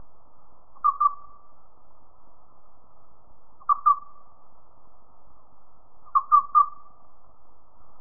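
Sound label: cricket short ringing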